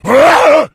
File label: shoved, npc infected